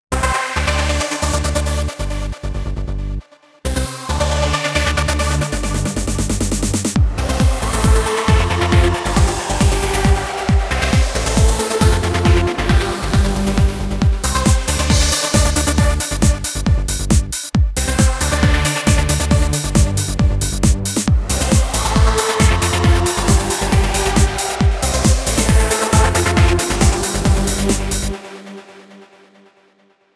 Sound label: Trance Up; ToneRack